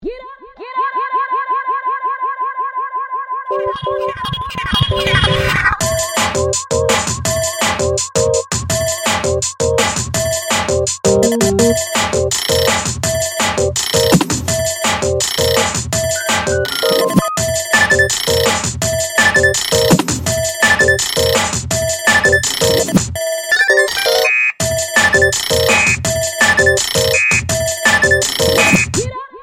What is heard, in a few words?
clocks; Рингтоны смартфона Micromax, мелодии будильника и сигналы сообщений